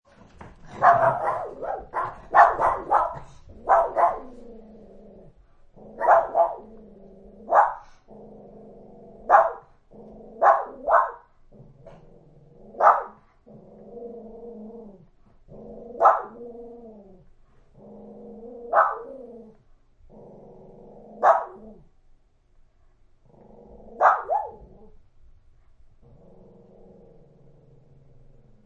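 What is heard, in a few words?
Dogs Small Barks Through Door; Big Room Sound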